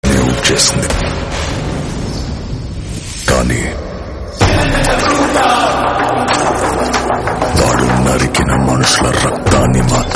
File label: HUNGRY CHEETAH TONE RINGTONE